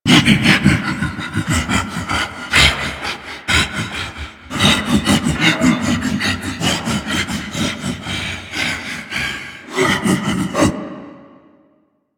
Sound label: Ape Prelate Breath Run Loop; Enemies; Warframe; Proto Infested